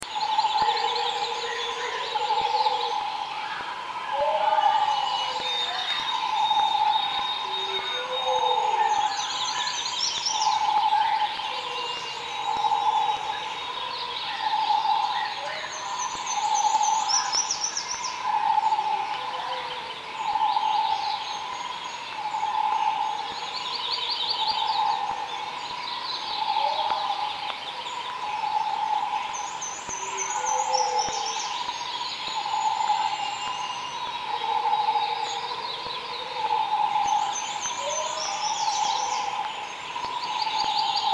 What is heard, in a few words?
forest; Star Wars Battlefront; Kashyyk; kasshykForest